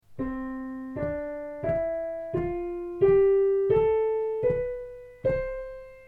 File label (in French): gamme